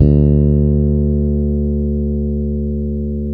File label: BRITE FRETLS D 3, Bass; Spectrasonics; Bass Legends CD 1